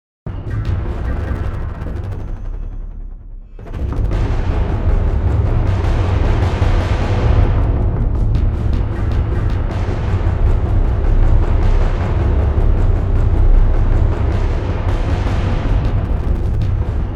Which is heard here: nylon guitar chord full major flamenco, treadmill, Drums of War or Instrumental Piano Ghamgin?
Drums of War